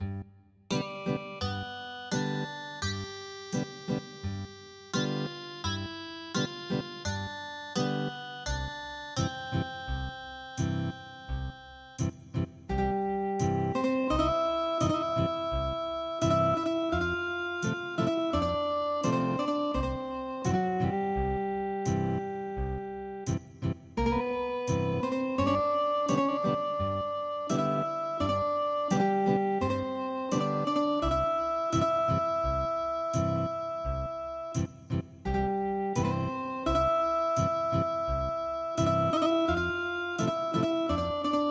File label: Ethnic Guitar